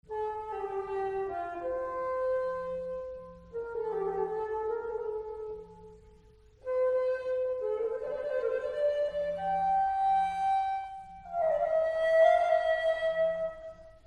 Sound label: Flute French